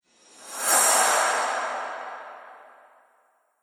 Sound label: Dramatic Movie Riser; Sci Fi Sound Effects